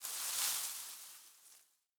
leaf rustle grassy hard

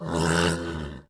Horse Die Vox; Civ4 warlords

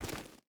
footstep rubber boots gravel walk; Rust

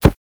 fire start; Rust

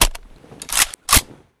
Inf Weapons Foley; Red Orchestra Ostfront 41-45

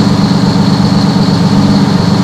truck idle; War Thunder

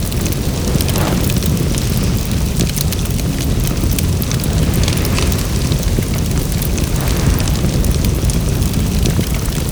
fire wood trash medium

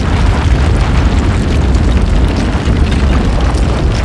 stones scatter heavy loop

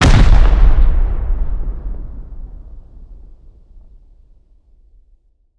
rocket explosion; Rust

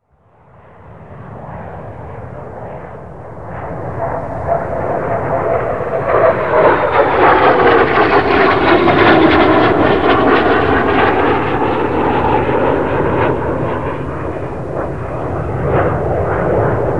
jet flyover; Call of Duty Modern Warfare

